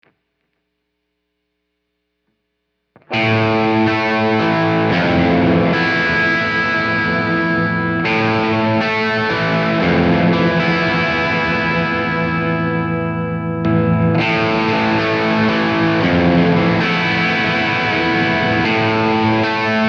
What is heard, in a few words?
classic s bridge